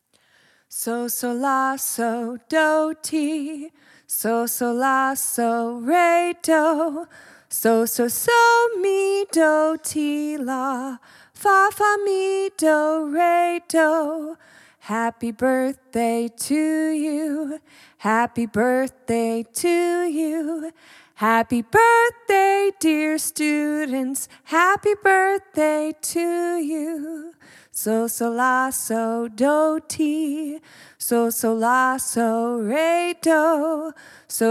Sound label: happy birthday solfege